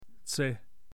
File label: Dog